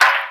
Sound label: Lo bamboo, Roland MC-303 Percussion; Drum Samples